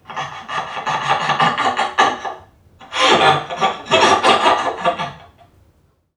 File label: Creatures Vocalisations Robot head; NPC; Five Nights At Akers; soma